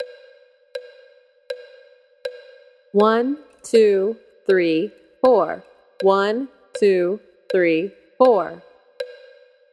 Quarter Notes; Quarter Notes, Example 1

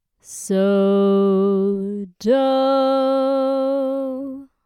sung dominant tonic; Hearing Harmonies

